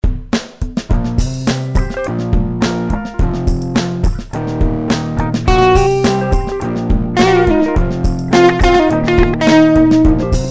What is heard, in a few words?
funky groove